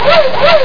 Revveit; F1GP; dopus

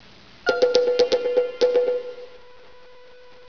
rhythm

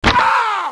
Flsh Hit

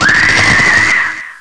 Die Electrocution; Monkey Shines 1.2.1; Fantasoft

